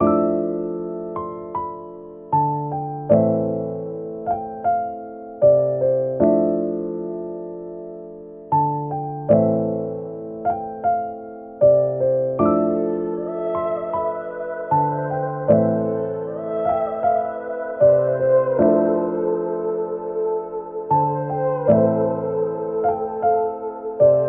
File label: piano with keys slightly funky, sad loop; Loops